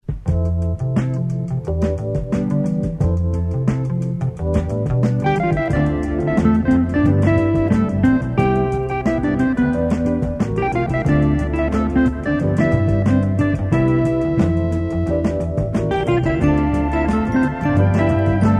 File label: bossa